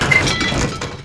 tower manager die

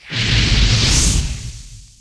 acidified zone fire

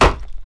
monster wooden mail

